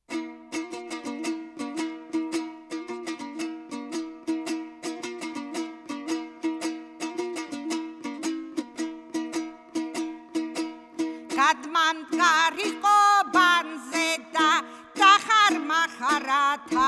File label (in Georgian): xevsuruli saxumaro fandurit tianeti; Mzetamze